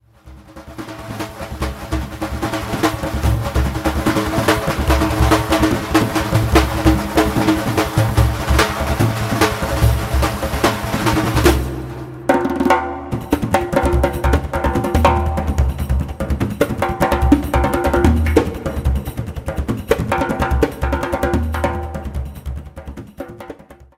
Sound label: Percussion Ensemble Playing